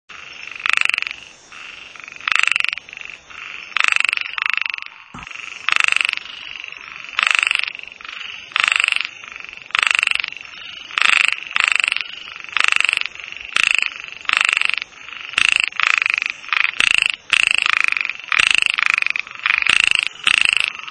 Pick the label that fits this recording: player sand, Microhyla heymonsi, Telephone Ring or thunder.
Microhyla heymonsi